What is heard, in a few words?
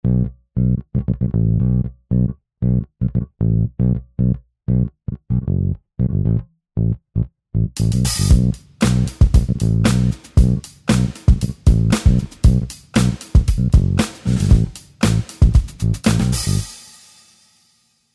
real sub bass original bass; mixing